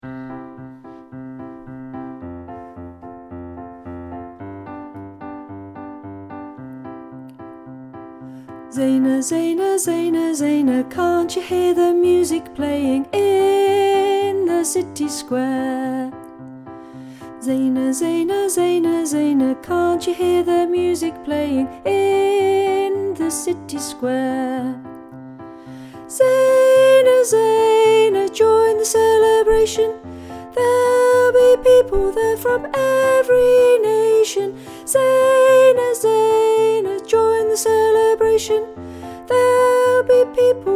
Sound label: single line; Key Stage 1